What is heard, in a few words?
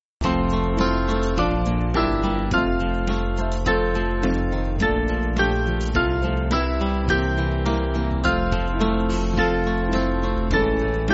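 Band, Music Backing Files for Churches; Far From All Care; Pevensey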